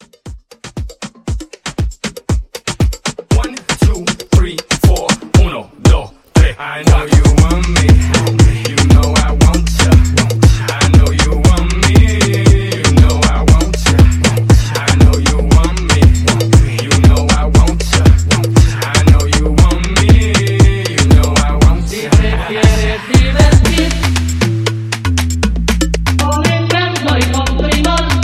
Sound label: Latin Club Hype 118